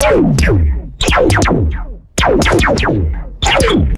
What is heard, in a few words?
vintage sci fi laser pistol blasts; lasers